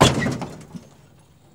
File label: crash, Crash Engine, Transportation; E-MU Producer Series Vol. 3 – Hollywood Sound Effects